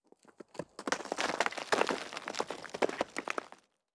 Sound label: debris; World of Tanks Blitz; ambient halloween 2018